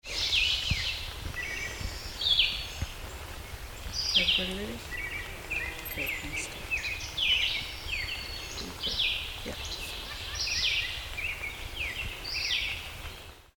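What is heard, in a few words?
Red-eyed Vireo Songs Talking; 7 Songs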